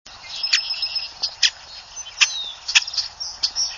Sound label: grackle boat-tailed female clucks; birdsongs